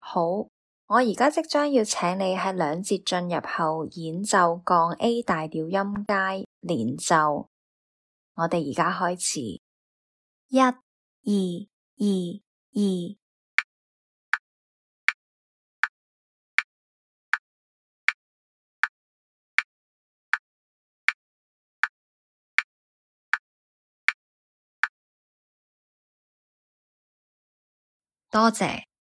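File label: A flat major scale legato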